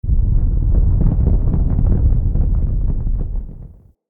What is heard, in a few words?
Falling Rock Small Rumble; Level Events; Undercity